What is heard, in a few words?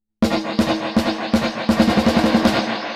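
Spring tank large tension 100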